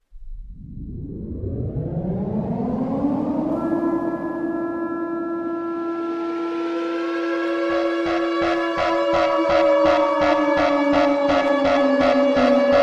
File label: Nuclear Siren Has music; Armagedon